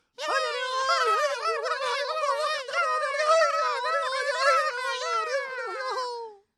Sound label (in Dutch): Feestje Kleine Mensen